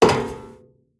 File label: Metal Walk